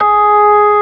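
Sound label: ORG. G#4; Best Service; Advanced Media Trax Modern Composer; B3 FULL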